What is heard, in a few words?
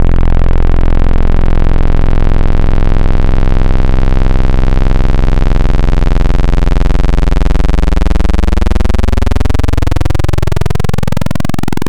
Analog PWM Custom, wavetable